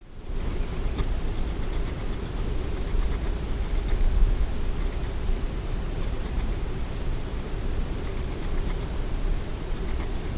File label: zebra finch; centralvic